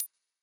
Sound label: count Up; netent